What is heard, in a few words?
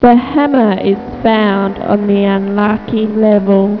MOUTH